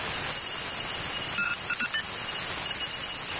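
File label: ambient computer; hellhole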